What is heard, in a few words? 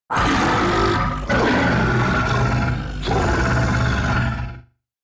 Octopede Taunt; Warframe